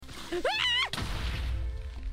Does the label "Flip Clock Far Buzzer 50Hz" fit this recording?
No